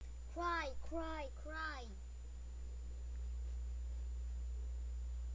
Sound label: cry